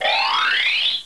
pos buzzer

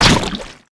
Wedding Cake

